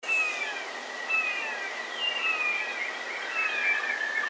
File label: Red Shoulder Call; Raptors